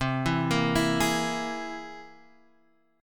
C 7th Flat 13th C, chord

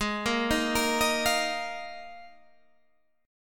A sharp 7th Suspended 4th G sharp, Guitar

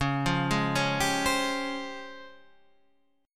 Db Major Sharp 11th, Guitar Standard; arpeggiated; JGuitar

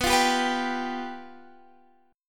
F Suspended 2nd Flat 5th B

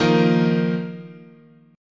D sharp Suspended 2nd, chord

